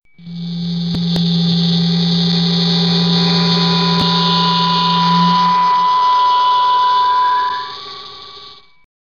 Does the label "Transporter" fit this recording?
Yes